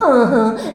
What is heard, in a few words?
"aha", shouts; Best Service; Hyper Dance